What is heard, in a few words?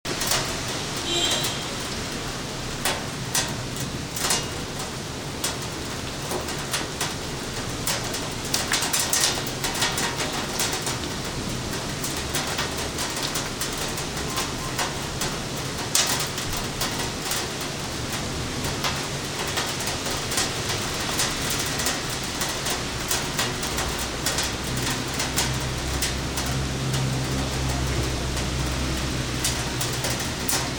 hailstones and rain